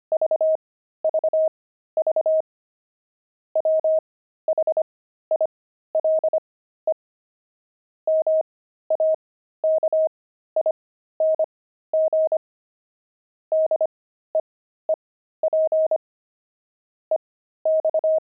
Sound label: pangram, intermediate pangrams files